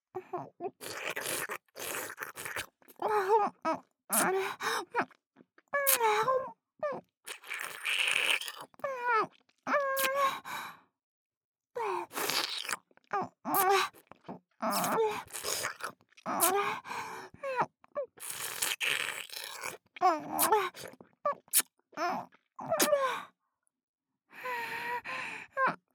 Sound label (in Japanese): タマタマフェラチオ【ループ】; ループトラック